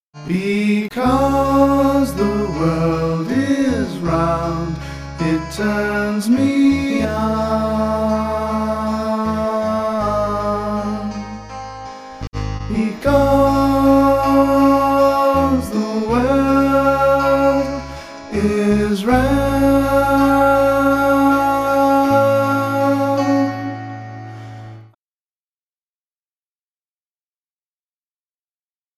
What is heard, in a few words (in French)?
basse